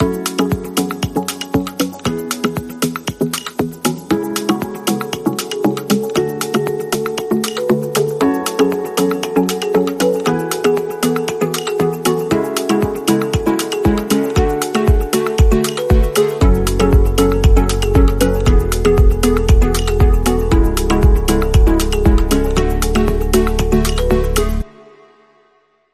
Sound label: Percussive tranquility